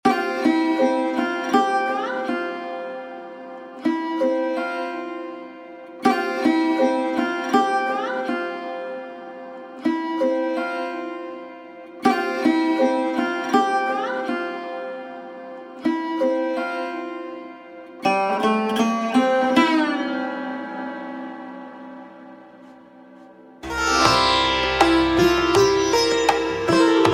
Bollywood

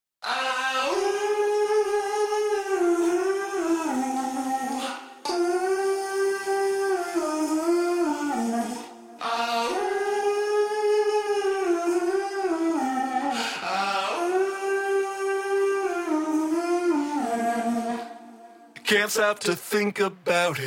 Vocal Stem

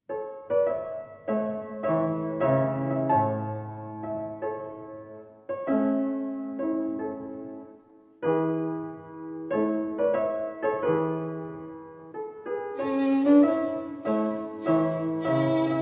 Violin Sonata in D Major, Andante

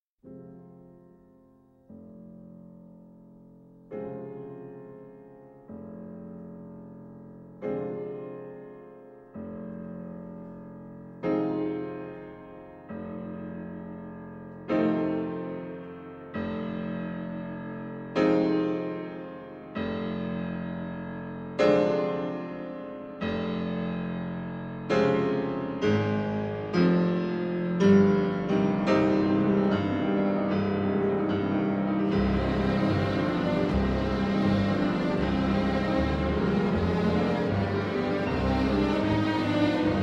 Piano Concerto No. 2 in C Minor, Moderato

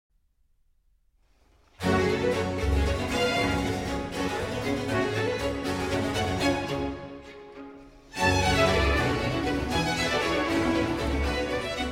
Violin Concerto in G Minor, Presto; BWV 1056R

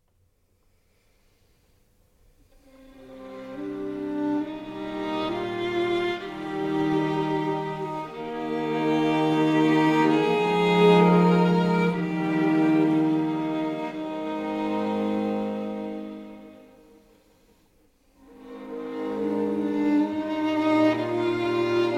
String Quartet Version

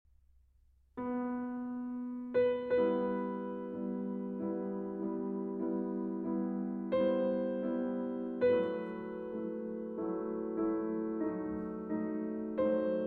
24 Preludes, Op. 28 No. 4 in E Minor

